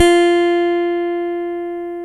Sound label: CHAP STICK, F 5; East Collexion - Bass S3000